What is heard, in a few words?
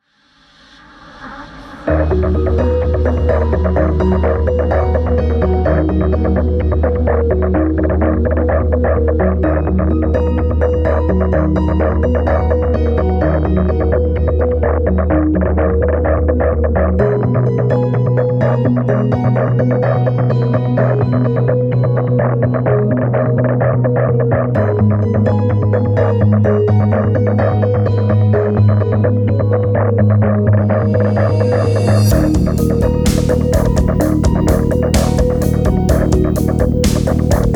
full instrumen